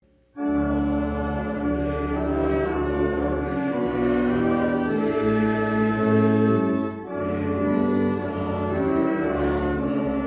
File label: trumpet